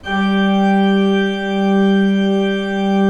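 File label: ORG G3, KEYS; Synclavier Sampler Library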